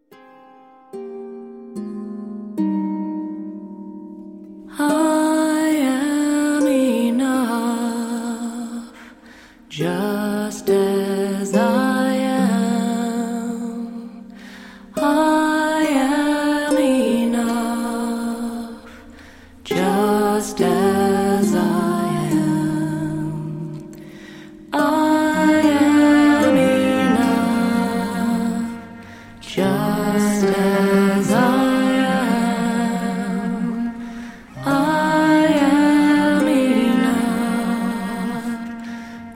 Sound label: Celtic chant version